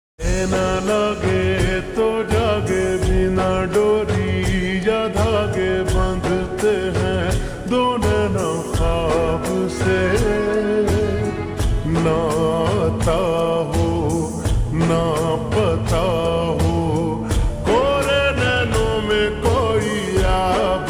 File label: Ringtone slowed reverb